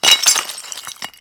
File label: Shatter, Stone; NV3D